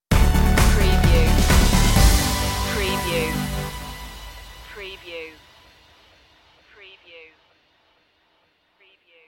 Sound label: well meet again stinger